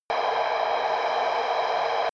drone spaceship metallic

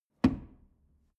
drawer medium close lock hard

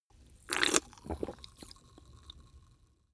drink soda can small slurp small

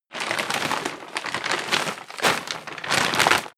paper bag sturdy handling

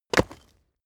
stone plate drop short

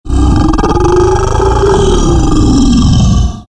danger growl

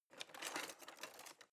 knives wood drawer grab soft

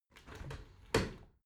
window open tilt

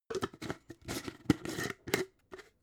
jar glass plastic close slow

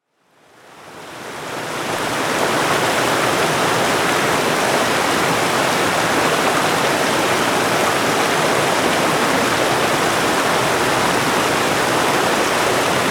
river atmo

